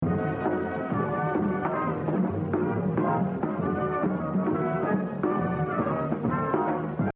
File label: Exercise 11, Jazz Drumming - 73%; Gene Krupa; The Evolution of Jazz Drumming (Gottlieb)